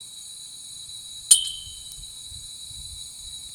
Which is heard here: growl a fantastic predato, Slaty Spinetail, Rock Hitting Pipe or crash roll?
Rock Hitting Pipe